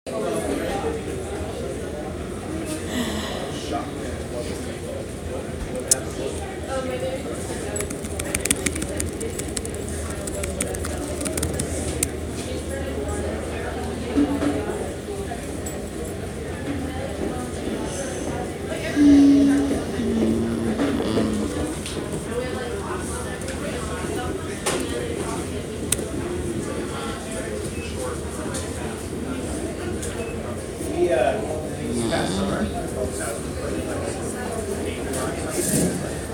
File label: Currently at Work